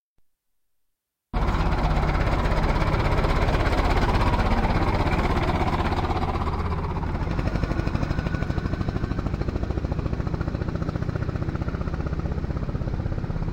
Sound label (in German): traktor; tiergeraeusche